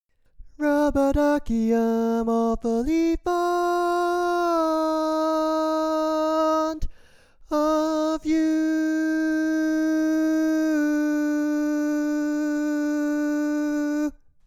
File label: Tenor, barbershop tags